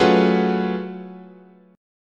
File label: Gb Minor Major 11th, chord, guitar